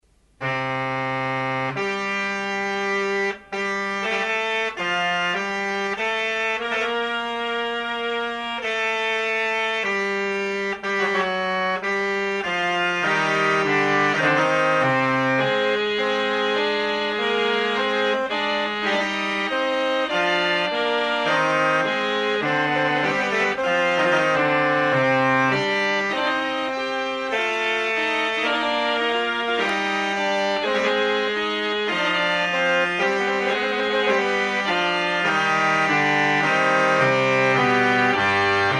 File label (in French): Trompette, organ